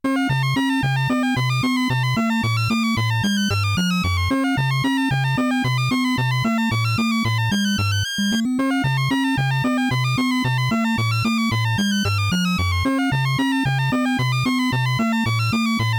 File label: High Score Music; Phozon; psx